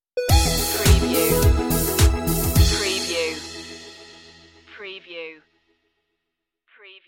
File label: boiling point stinger